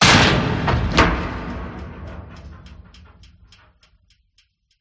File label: Metal Impact Crash Hit; PMSFX